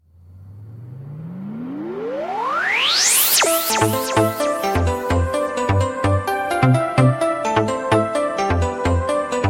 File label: Attarctive Ringtone